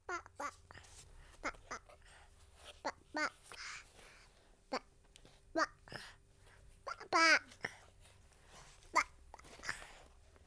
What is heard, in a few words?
16 month old boy looking at a book, Babies; The BBC Sound Effects Library